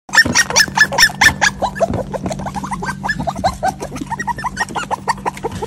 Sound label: Poodle; سگ پودل